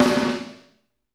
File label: FLAM GATE, PROC SNRS, Drumworks; Sampleheads; New York City Drumworks VOL-1